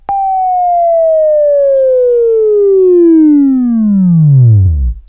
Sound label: Buzz, Left; Labview-Program; Sweeping Training program